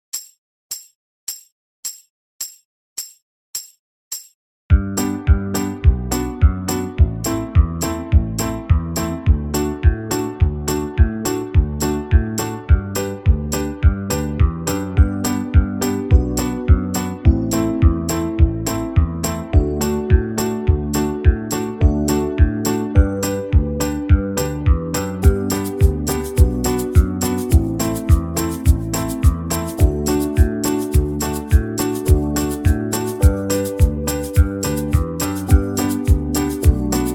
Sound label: key of g normal, banjo backing tracks